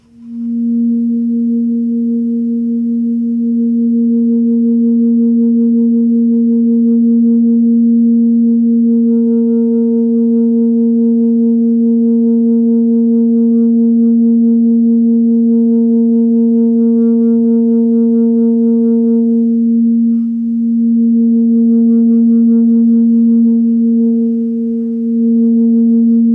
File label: alto sax